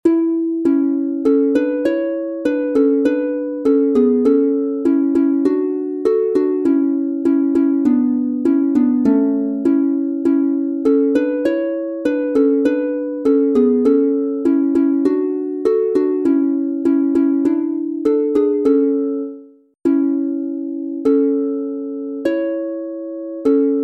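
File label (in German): A Dur 2 stimmig instrumental, Harfe; Seemannslieder; Wir lieben die Stuerme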